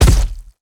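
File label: Footsteps Land Heavy Mud Dry; BIGMOUTH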